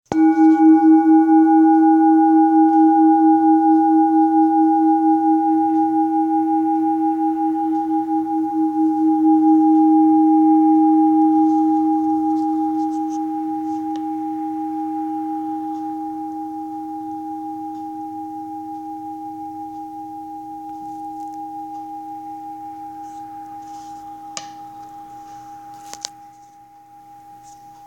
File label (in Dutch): Klankschaal